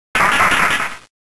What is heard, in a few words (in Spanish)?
Grito de Ludicolo